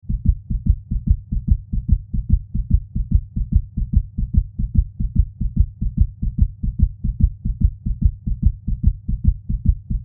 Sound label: Heartbeat